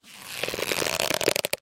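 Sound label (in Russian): ras, zvuk rastiagivaniia, stretching sound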